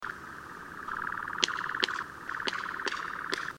applauso succiacapre prodotto con le ali; succiacapre l'uccello che sa applaudire

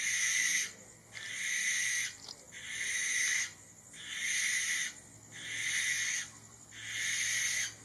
barbagianni canto

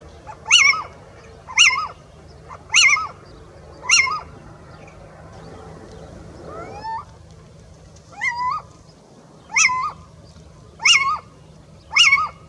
civetta femmina canto; Raffaele Imondi

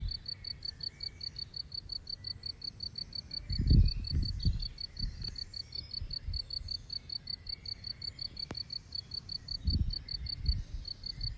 Grillo

